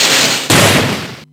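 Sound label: magic thunder; Etrian Odyssey I HD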